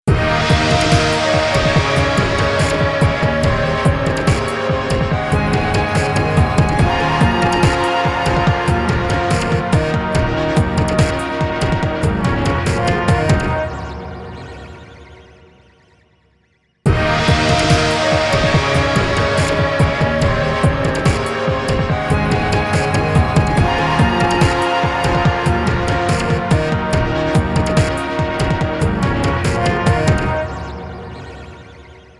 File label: Jingle HEROIC; asterix obelix xxl 2 mission wifix 2006 playstation portable gamerip